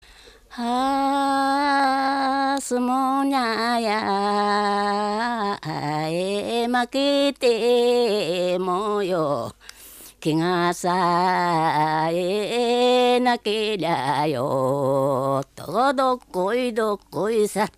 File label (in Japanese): sumo jinku; 相撲甚句; 香取市